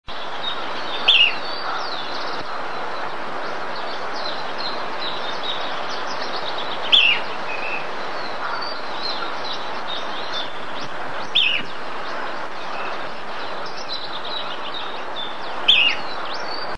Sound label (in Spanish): voz fiofío pico corto